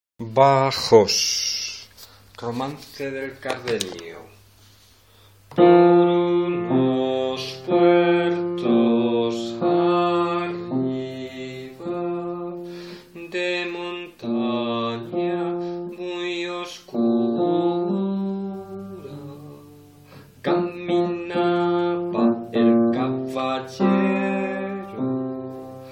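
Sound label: romance bass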